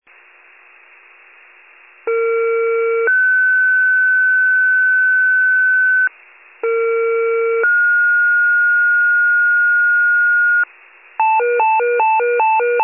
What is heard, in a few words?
FIRE pager alert